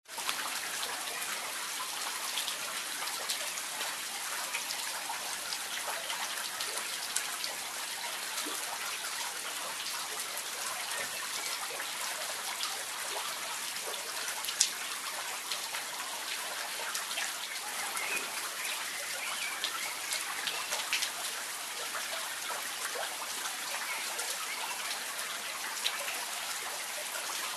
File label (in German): Gradierwerk